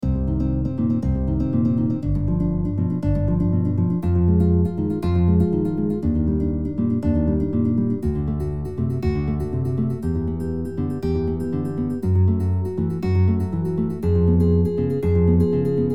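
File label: correctly voiced guitar chords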